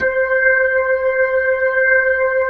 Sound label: B-3 C 6, Jazz; Keyboards of The 60's and 70's